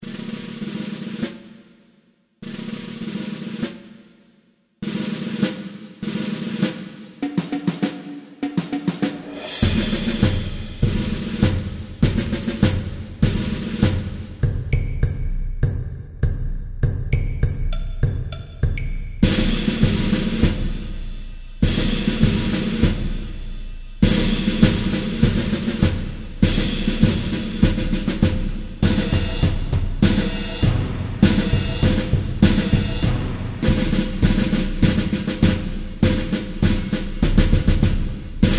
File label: drums